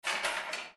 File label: Door Key Unlock